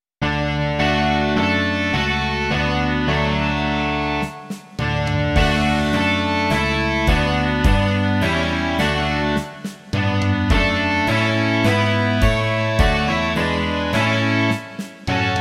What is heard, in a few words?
rock